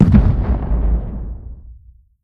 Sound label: station explosion small; weapons; Stellaris